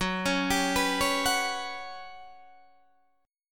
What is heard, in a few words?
G Major 7th Flat 5th F sharp